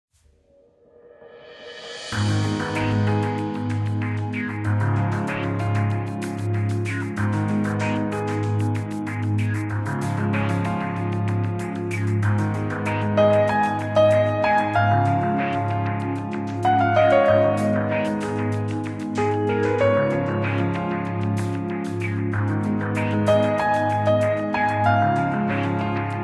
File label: Game Over Loop 95 4-4